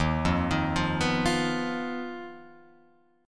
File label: Eb Suspended 2nd Sharp 5th, Guitar, Open F; Ebsus2#5 arpeggiated; JGuitar